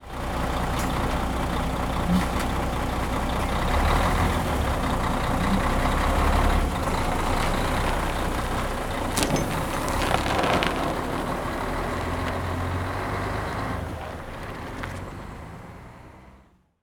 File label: truck departing; transportation